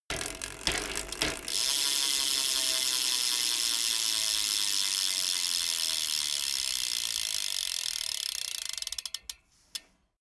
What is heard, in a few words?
bmx bike pedal once coast